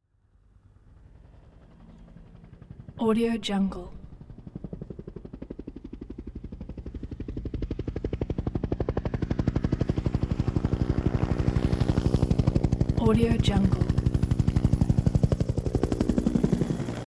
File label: bell huey helicopter